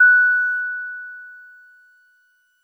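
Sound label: DreamLike DX100 F sharp -1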